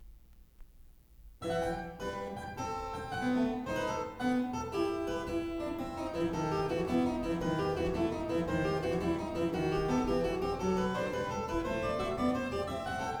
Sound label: E minor, Tempo di Gavotta; Partita No.6; BWV 830; BACH; CLAVIER WORKS; PARTITAS